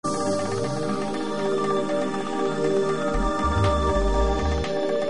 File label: melody